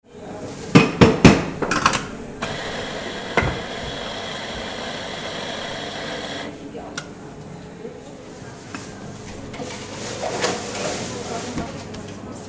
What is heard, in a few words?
coffeeshop